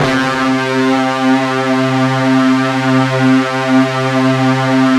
FUZZ C 2, Guitar; ULT FZZ LOOP; East West; Steve Stevens Guitar Samples Collection

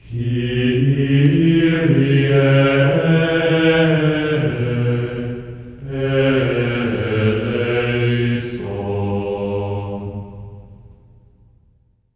KYRIE C#4; Spectrasonics; Symphony of Voices; GR KYRIE

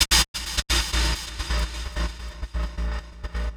Gated Cymbal; musicradar

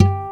G4, ACSTC.G; AKAI S-Series CD-ROM Sound Library VOL-2

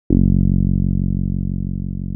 TB 303

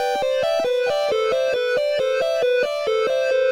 Dubwyzz Keys, Indie Rock; Sonic Foundry (Sony Creative Software); Indie Rock Anthems; Session 04 - Dubwyzz

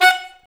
SAX Alto STAB, Alto Section FX

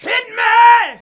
VOX VOX; Sound & Vision - Gigapack II CD 2

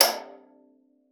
VIOLA FINGER; Xsample VOL-5

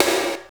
snare, Snares; Roland L-CD701; Snares 5; Sn Modules 5

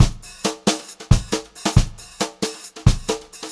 136 BEAT, Brushed Drum Loops; USB Soundscan vol.39; AKAI; HOTROD

